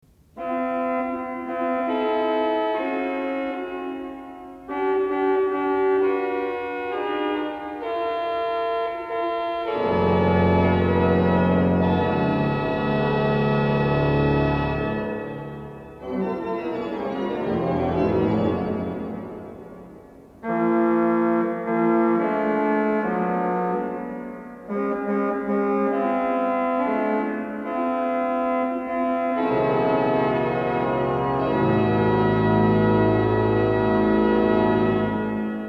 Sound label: PARAPHONIC FANFARE, organ